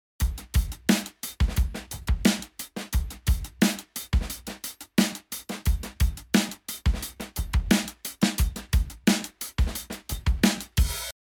Drums Dry

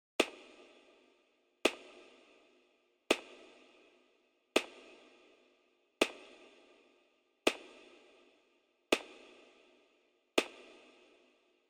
Snap Dist

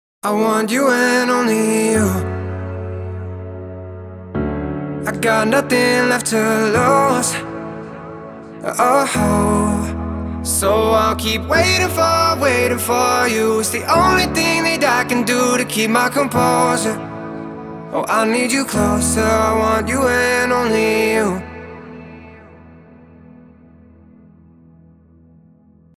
main manipulator piano

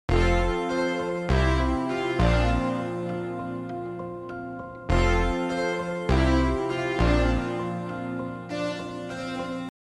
Synth Dry

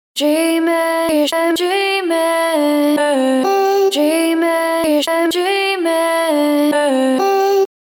Add rythm

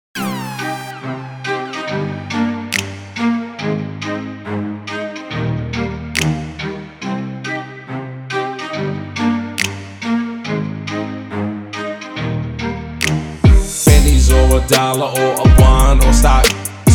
808 Hip Hop

